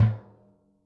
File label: XKICKS, Living Drums; Sampleheads; Peter Erskine Living Drums